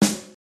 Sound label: loud acoustic snare sample g sharp key